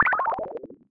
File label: fall; pyrogue; Oryx